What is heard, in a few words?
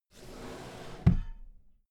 drawer cabinet equipment close soft halfway